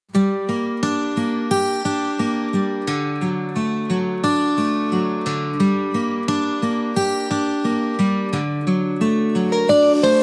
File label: la lluvia